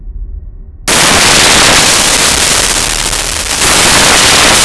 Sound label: shell hum; Tribes2; t2demo